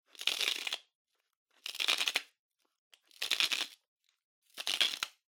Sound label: Spice Mill Salt